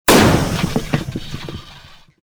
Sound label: tire blow; Soldier Of Fortune II Double Helix; XBOX; ambience